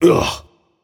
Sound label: ugh; smite; voicepack; ravana